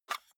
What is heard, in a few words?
cassette case open medium; objekte; kassette